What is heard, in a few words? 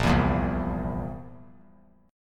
A sharp Suspended 2nd Flat 5th A sharp; strummed; A#sus2b5; JGuitar